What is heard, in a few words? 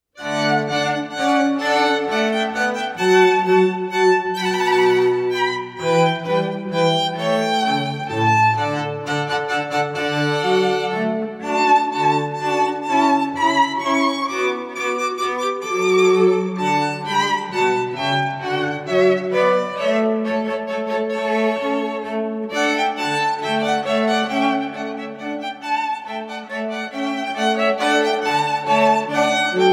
Coro from The Water Music